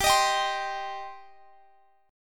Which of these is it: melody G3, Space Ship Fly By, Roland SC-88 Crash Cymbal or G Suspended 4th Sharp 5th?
G Suspended 4th Sharp 5th